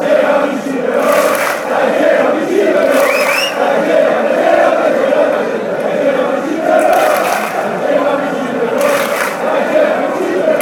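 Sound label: go genova, football chant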